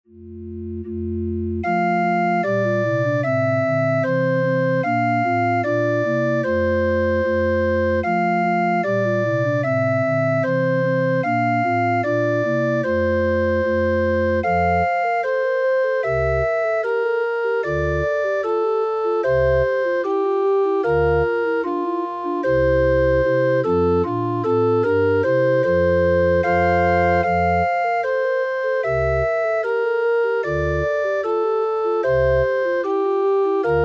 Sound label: Ding Dong; Ding, Dong, Merrily on High; christmas; festival choir; Llanddewi